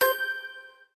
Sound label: countdown